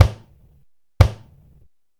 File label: drums; jb snow